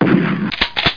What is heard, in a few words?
shotgun; kabloey redux